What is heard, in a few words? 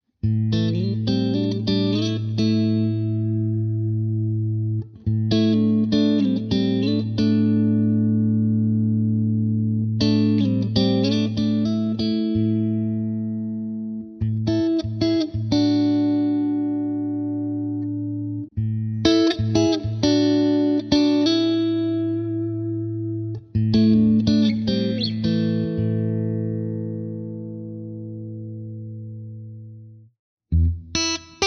Middle Neck, pickups